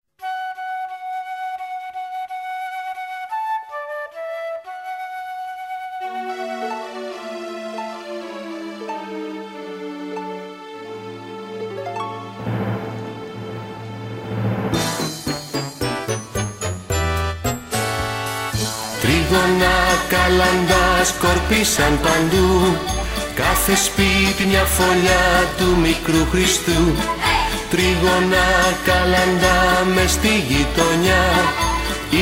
Κάλαντα; Α Τρίγωνα

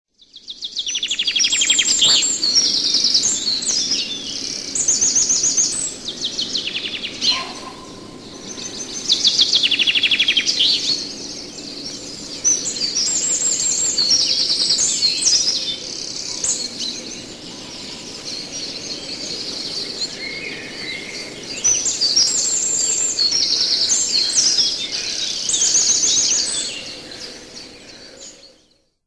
Ηχητικά παραδείγματα Κελάηδισμα

